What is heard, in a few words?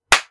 Simple Clap